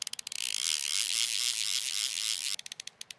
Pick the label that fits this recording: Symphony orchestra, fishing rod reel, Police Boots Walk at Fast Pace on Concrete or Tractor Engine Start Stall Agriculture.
fishing rod reel